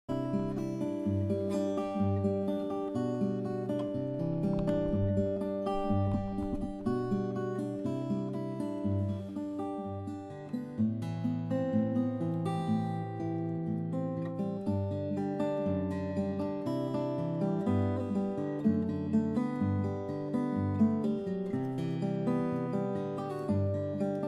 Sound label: Wild Goose, guitar